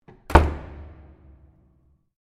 Door Heavy Fire Escape Close Stereo, Heavy weight door to fire escape; Miscellaneous Doors